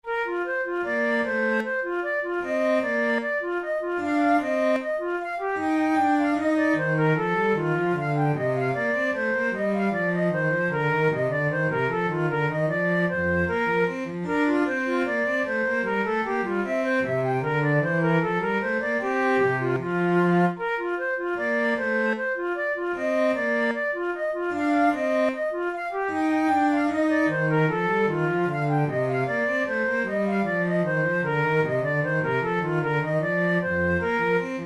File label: presto, flute